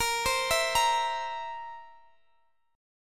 A sharp Suspended 2nd Flat 5th, down, Guitar, Half Step Down